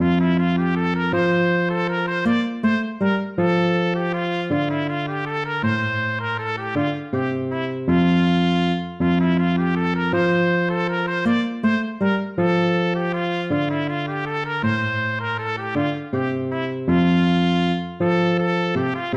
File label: lute